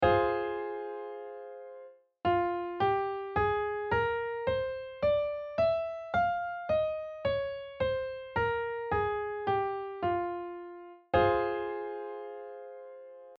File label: Greensleeves Fm Scale